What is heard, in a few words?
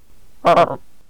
Frog